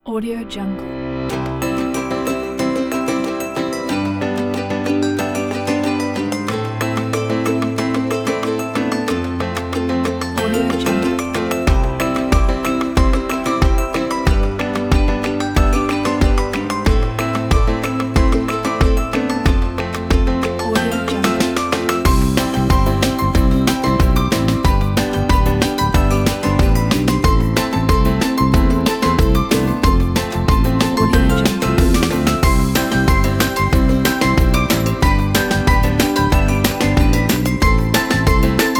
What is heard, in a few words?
fun upbeat acoustic summer ukulele; soundmanmaster